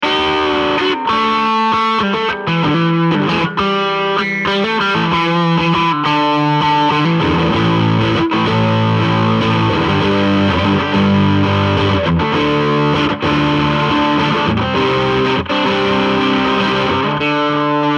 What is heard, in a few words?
max gain tele